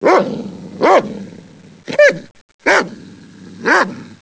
wilczur

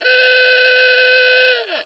syrena

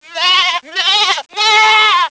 kozka

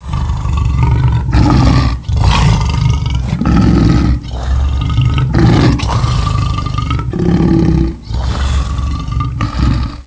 lew

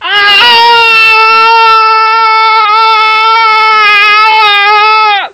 krzyk

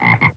zaba

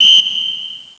gwizdek

